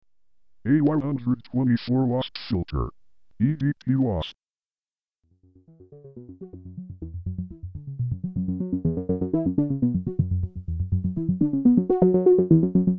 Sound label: EDP Wasp BPF sequ dry